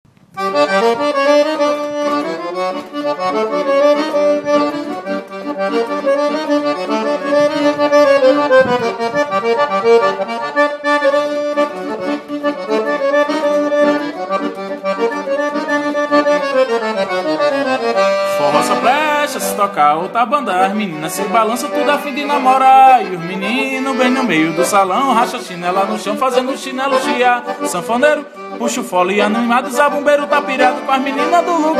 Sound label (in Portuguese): forro pra namorar